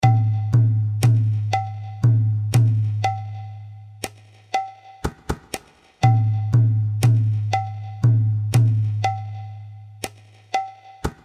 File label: lofa, tala